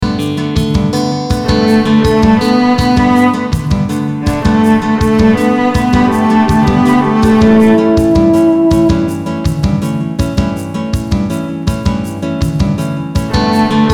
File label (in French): Violoncelle, Violoncelle dans l’arrangement; cello, violoncelle